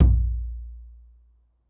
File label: Kendang, Gamelan